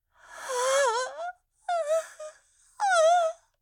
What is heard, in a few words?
Agony Pain Standing Still; Zara; devour 2021 gamerip pc macos